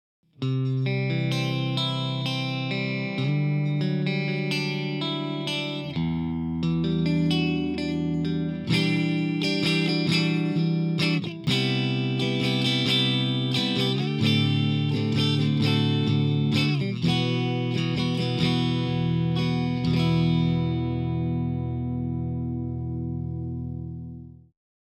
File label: pick compare clean